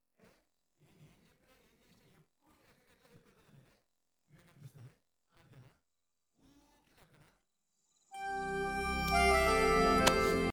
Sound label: happy no vocals